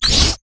heat seeking missile lock; urgot; LoL

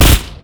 spray and pray; LoL; gangster twitch

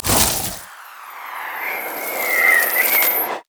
rebirth; LoL; anivia

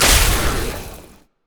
spider attack; LoL

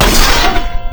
basic attack; LoL; garen base

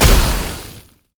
flame breath; LoL; shyvana

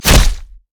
pounce; nidalee base

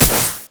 grenade; rumble

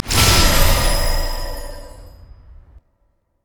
health pack; odin

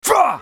spell cast effort; Shen; Character Voices - English

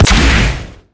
carpet bomb; rumble skin03

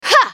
spellcast effort; Akali

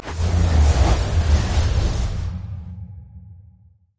blast wind; lissandra skin01

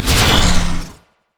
shield; rumble skin03

